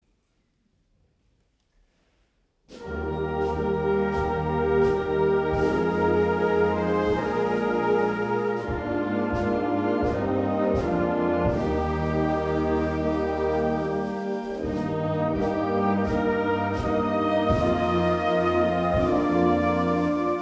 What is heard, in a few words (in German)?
choral